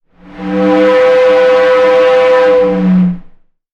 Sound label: sound design effect air pipe pressure